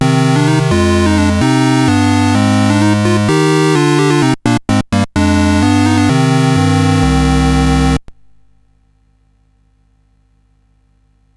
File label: Dragonworld sid CSG8580R5